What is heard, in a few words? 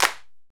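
PERCUSSION CLAPS; Roland L-CD701; Clap & Snap; Clap Modules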